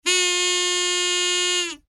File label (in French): cotillon